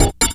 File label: Bell; Sonic Foundry (Sony Creative Software); Esoterik Beatz; Saver FX